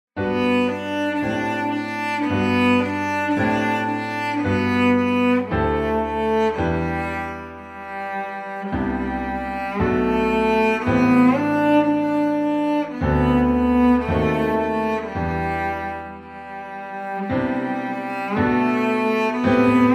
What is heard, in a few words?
cello